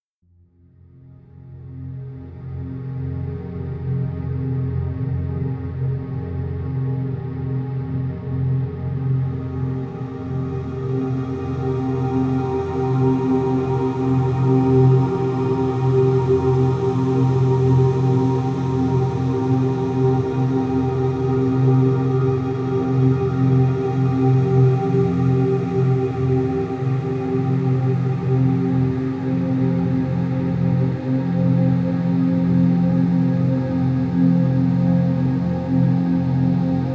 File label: Solar Waves; فرکانس963